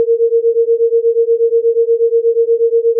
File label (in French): battements